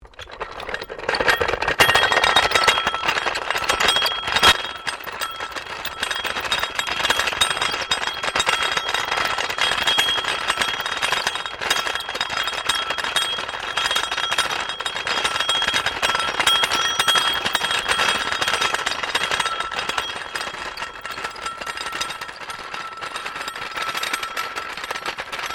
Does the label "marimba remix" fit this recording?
No